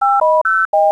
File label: keycode; svencoop